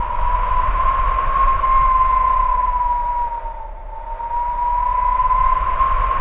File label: WIND HOWL, VOCAL WIND; ILIO; Vocal Planet; Jazz & FX